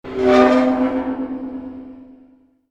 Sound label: metallic scream